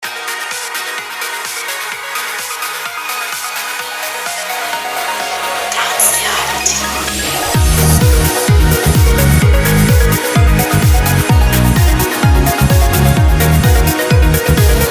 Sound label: ringtone